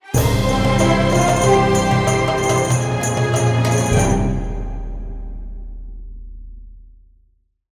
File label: Win